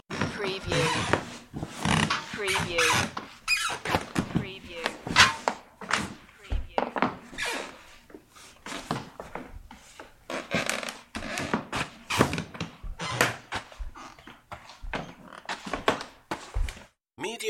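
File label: Footsteps Creaky Floor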